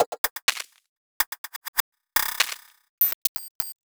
Minimal, 125 BPM, Percussion; Sonic Foundry (Sony Creative Software); Underground UK House & Electro

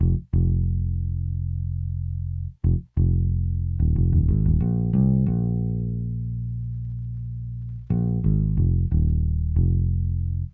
BSE, Hip Hop and R&B; Best Service ProSamples vol.01; AKAI

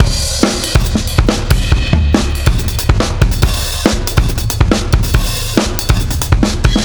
Bon Bon Bizzle, Breakbeats, Drum n Bass; KJ Sawka; Drum n Bass & Beyond

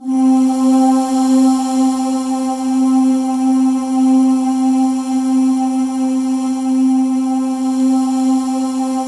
C4, Synth Leads

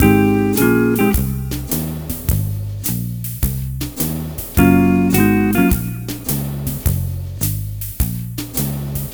Multi Guitar Swing Mix-G#, 105; Zero-G Kocktail Kollection; Construction Kitz (Lounge)

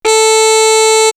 mute trumpet, horn section; X-Static Goldmine; backtothe70's

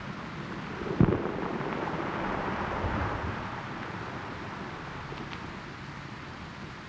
Beach Dark, Water

